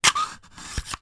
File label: dehumidifying egg place in holder